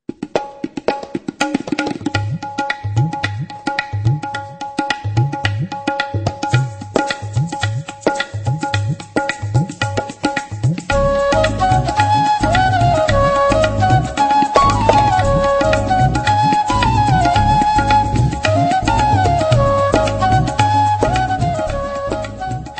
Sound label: qawalli flute ringtone; bollywood ringtones